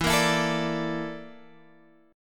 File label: Bb Major 7th Flat 5th